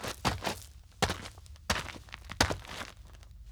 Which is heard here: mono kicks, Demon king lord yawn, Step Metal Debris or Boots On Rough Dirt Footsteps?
Boots On Rough Dirt Footsteps